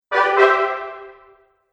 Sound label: Fanfare; Musical